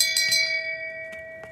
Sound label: shame bell